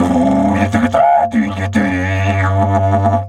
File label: G2 WILD, Didgeridoo; Big Fish Audio - Didgeridoo and Other Primitive Instruments